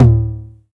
low tome drum sample a key

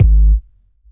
long tail reverb kickdrum sample g sharp key

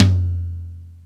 long tom drum one shot f key; tom drum samples; one shots

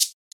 shaker g sharp key; percussion

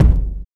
boomy kick one shot g key

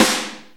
small reverb acoustic snare b key

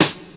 00s short acoustic snare d sharp key

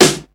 clean steel snare drum f key; snare-drum-samples

